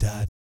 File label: "DAT" G#2, Vocal Jazz; Spectrasonics; Vocal Planet Vol.3 Jazz Fx